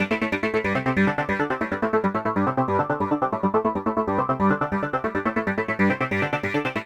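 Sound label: synth electro 140 A; Techno; Keys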